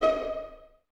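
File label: Violins D#5 pizzicato, String Ensembles; 23 Violins; Miroslav Vitous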